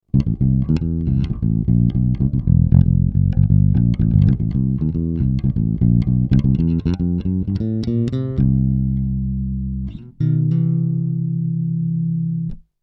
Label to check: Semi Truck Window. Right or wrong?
Wrong